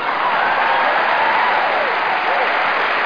clap; Super Show and Tell

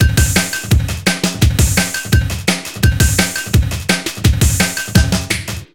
drum lp, techno

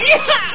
yihaa

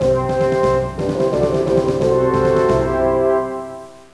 FANFARE; Civilization II; Civ II PPC Demo

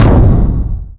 drum hit

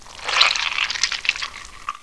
ROARK; Reflux

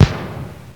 Puff Plant Pop; Pinball

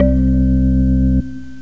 ORGAN; organ 2

